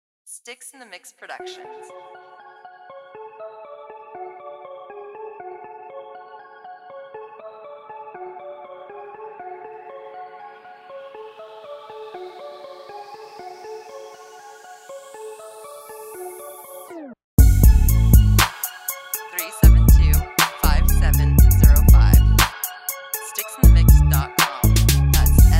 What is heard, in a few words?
Knife Orgun; Knife Organ